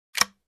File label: Reloading ammo